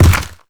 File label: step heavy, zombie blind; Half Life Alyx; creatures